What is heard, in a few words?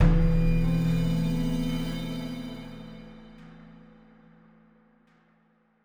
stinger Critical Success